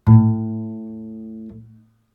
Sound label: Double Bass A 1 Pizzicato Non Vibrato